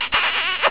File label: kiss